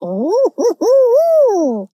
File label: player victory; fallguys